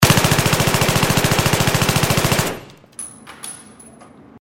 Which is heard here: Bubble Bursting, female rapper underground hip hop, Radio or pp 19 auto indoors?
pp 19 auto indoors